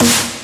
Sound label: Snare; VEC1; Club